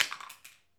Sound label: plastic object drops